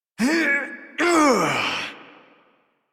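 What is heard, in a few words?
Exertion, Adult, English; Warframe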